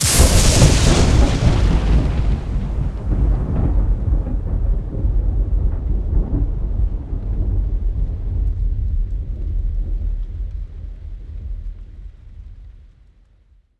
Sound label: Lightning Thunder 1 shot; Journey